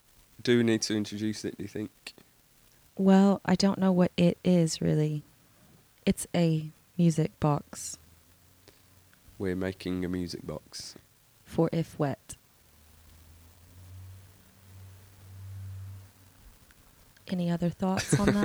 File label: MusicalBox